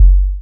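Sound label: bass drum pure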